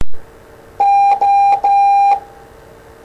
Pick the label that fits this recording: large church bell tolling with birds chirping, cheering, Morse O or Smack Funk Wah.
Morse O